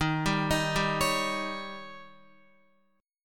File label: Eb 7th, Guitar; arpeggiated; x,6,7,8,7,9